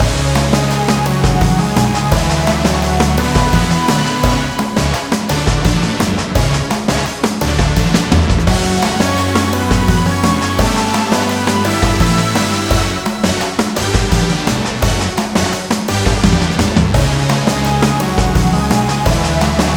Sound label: BGM MIDDLE BOSS